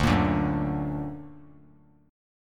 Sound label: D Augmented D down; D+ strummed; JGuitar